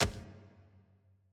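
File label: ambience room polygon punchbag training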